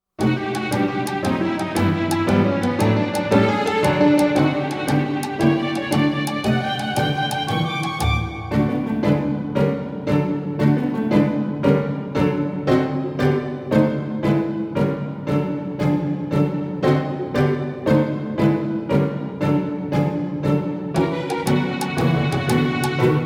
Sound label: minus trombone; album in trombone